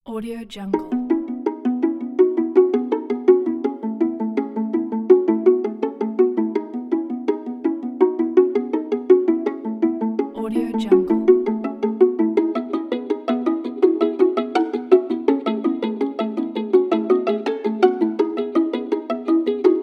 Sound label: corporate technology and human spirit